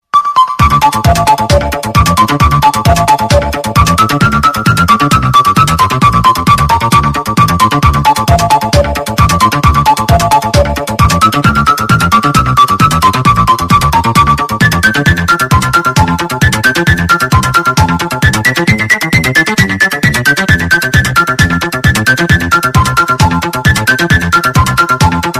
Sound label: Tune